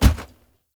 Heavy Grunt Boot Walk Default, Footsteps; Characters; Enemy; Sinecure

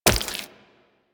Wall Jump Flesh; Footsteps; Warframe; Tenno; Wall Run

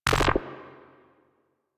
Corrupted Notes I; Warframe; Bard Quest; Bard Boss Fight

